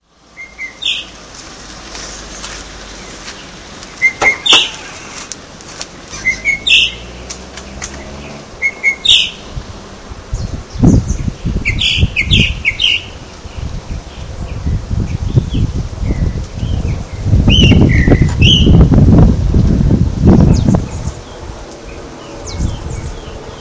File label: Mimus gilvus, Aruba; Baby Beach